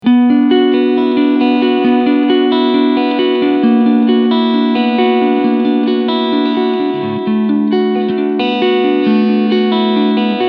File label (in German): Cleansound Front